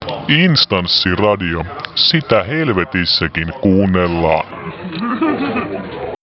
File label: jingle; instanssi18